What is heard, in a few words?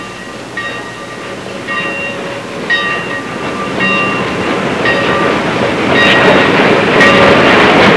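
train; trasporti; treni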